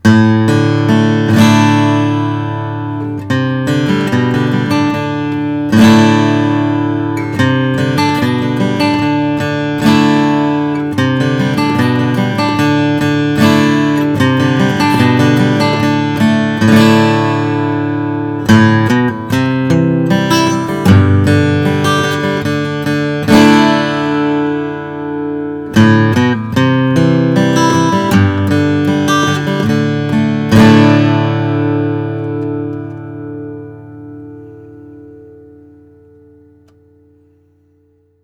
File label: bourgeois custom omc european